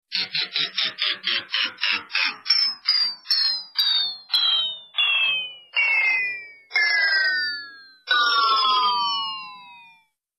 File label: Warped Ring, Ringtones